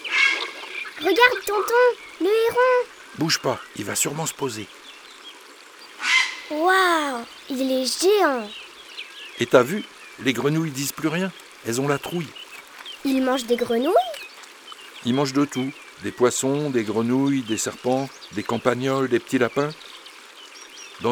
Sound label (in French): Une balade au bord de l'eau Le heron cendre; Studio les Trois Becs